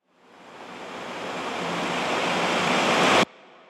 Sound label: Riser Sound Effect Long